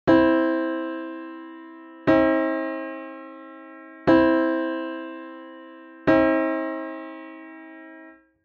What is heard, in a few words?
counterpoint thirds; Sqrt(25 24)